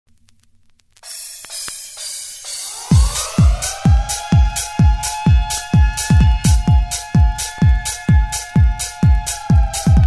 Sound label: Dub Train, 90's Dance